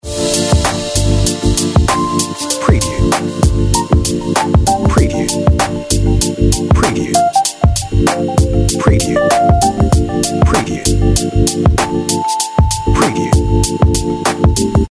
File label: On Hold Music